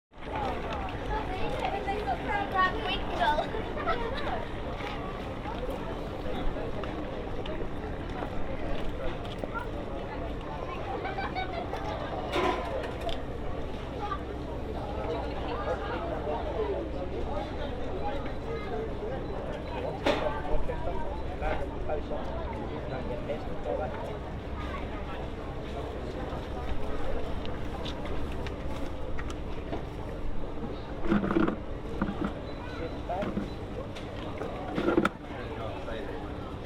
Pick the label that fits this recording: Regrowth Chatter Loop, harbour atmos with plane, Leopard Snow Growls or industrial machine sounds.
harbour atmos with plane